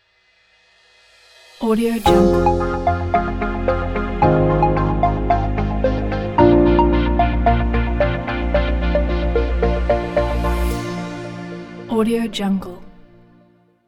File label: documentary ident